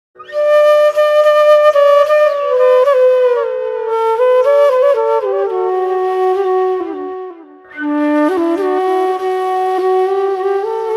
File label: Flute Instrumental; Tamil